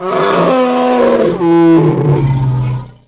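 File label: mammoth die; DAoC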